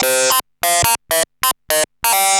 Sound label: Arp E Line, ARP Odyssey; musicradar-synth-samples